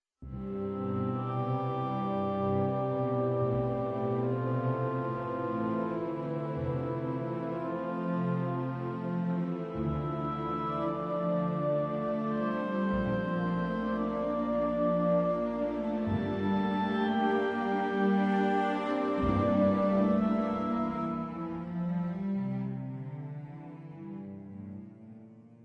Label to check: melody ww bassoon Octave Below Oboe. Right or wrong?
Right